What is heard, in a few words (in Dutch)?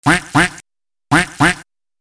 Eend kwek kwek